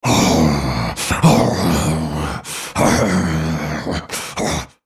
spellcast effort; Volibear

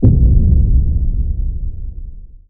scare; tunnels

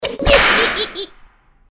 hit; freak fortress 2; cream

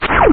shot, samus powersuit

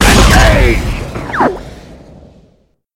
jump; zerstorer

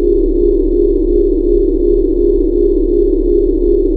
ufo

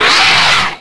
alien pain; xenomorphs

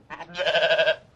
sheep

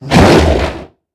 baron voice; doom4

